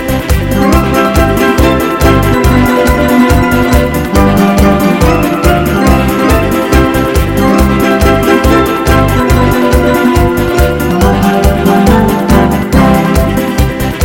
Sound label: Fast Cool Merengue